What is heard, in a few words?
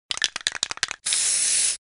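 sounds of aerosol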